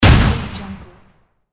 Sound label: bang